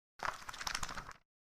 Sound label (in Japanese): parapara